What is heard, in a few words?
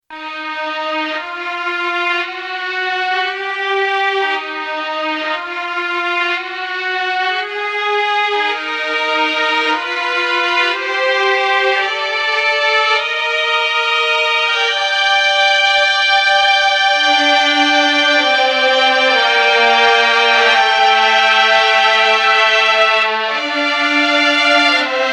Sad Strings